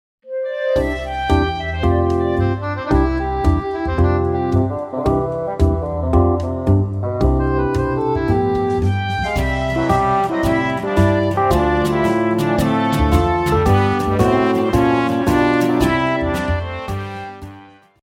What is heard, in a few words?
Power Workout Instrumental